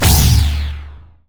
sci-fi weapon blaster laser boom zap; Gamemaster Audio; Sci-Fi Sounds and Sci-Fi Weapons

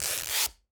Masking tape being stripped off, quick movement; Sound Ex Machina; SCRATCHES

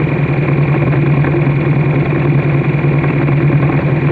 ENGINE Motor Heavy Loop; GameBurp; 2000 Game SFX

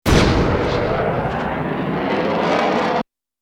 Bullet Time; FXHome; Bullet and Gun

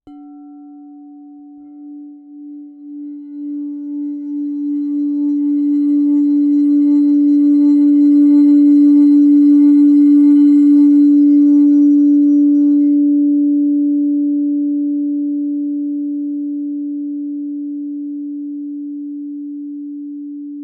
SINGING BOWL Strike Sustain D; Prismo Sound

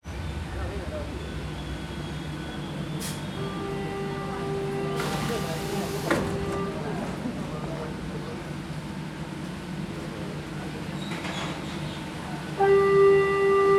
Subway Station Train, Buenos Aires; Traffic; Wakerone; Traffic X Buenos Aires

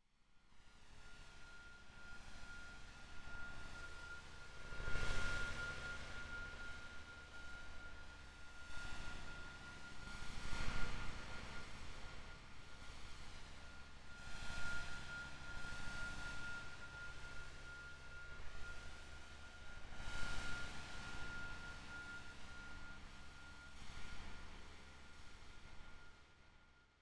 Magnetic Field; 99Sounds; Magnetic Fields; Magnolia

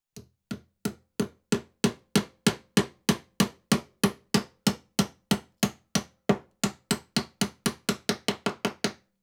Floor Nail Hammered (Changing Rhy; Construction; BBC Sound Effects Library

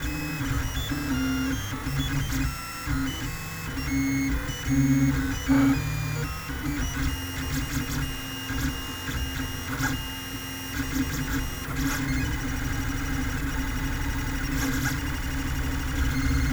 3D Printer Sequence, Lulzbot mini; No EMF; MattLightbound

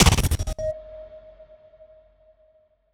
HITS; 99Sounds; Cinematic Sound Effects; Generdyn

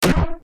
Futuristic Gun Sound; Bullet and Gun; FXHome

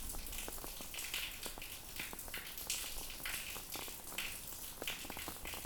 rain sewer; 99Sounds; Rain And Thunder

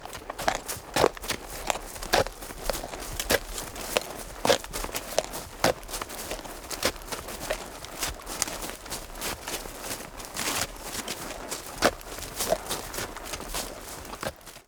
Feet Horse Draft Horse Walk On On Grass And Dirt; Horse Carriage; MONO; MKH416; Dramatic Cat

